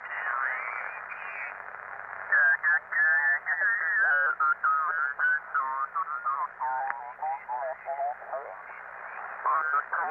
Futz Radio: Radio Static And Warble. Effect Has An Electrical Aspect. Mono